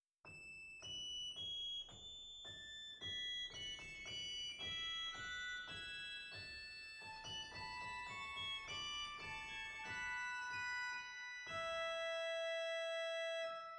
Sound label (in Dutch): Borstwerk Quintanus; zwolle